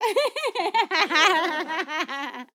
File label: VOCAL Fills 100bpm A; lol